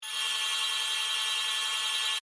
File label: Scanner Beam Ray, Scifi; Tech; Sound Effects:Science